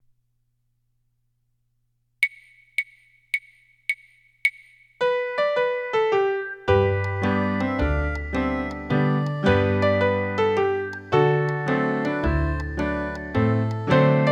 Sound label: SOLO W ACCOMP